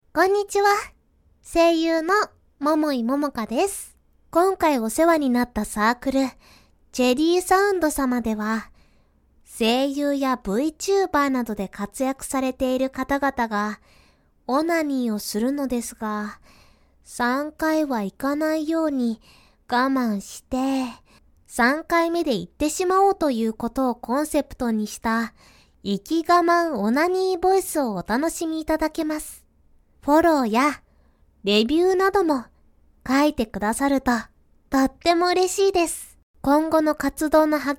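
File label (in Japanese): イキ我慢オナニー ロリ声で喘ぎながら徐々に激しくなる快楽落ちオナニー実演; ちぇりーさうんど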